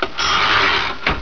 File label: MACHINE